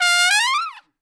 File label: Trumpet Noise; Sonic Foundry (Sony Creative Software); Jazz Solos and Sections